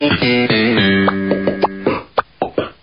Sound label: Bass Transition; Seinfeld